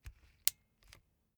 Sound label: bicycle street gear control upshift